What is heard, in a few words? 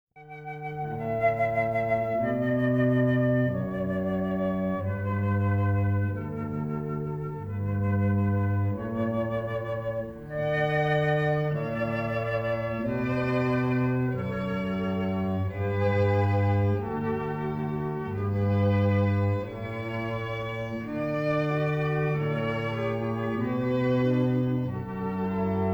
Flute, Violin, Cello